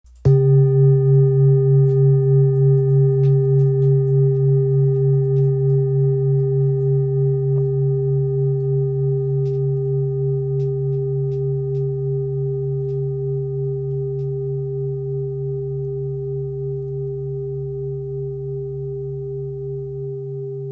bol therapeute fa diese 1.32kg

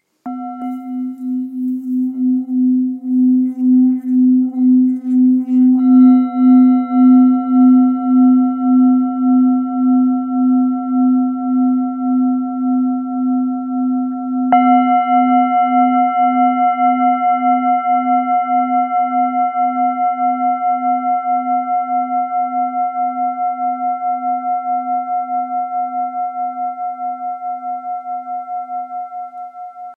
Bengale mi

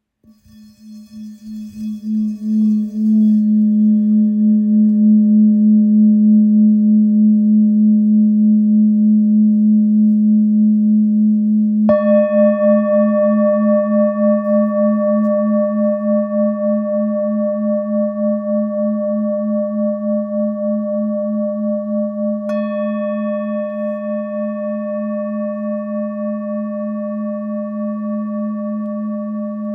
Bol ancien la

